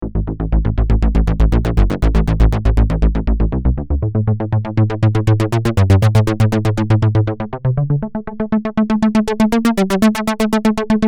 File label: synth